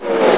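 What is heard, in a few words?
SHIELD OFF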